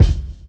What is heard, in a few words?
kick sample c key, bass drum one shot tuned to the C note. Loudest frequency: 212Hz